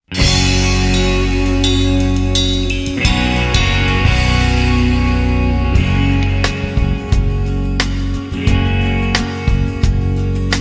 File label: Backing Track